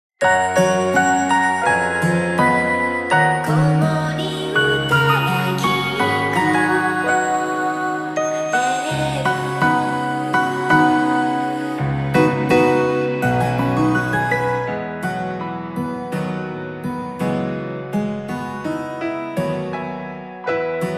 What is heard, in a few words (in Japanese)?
komoriuta karaoke; 癒し系バラード; 被災地から離れたところに住みながら、何かできることはないのかと悶々としている方々に捧げる、癒し系バラード。